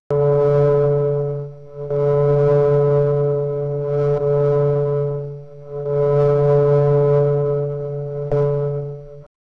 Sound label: old interphone sound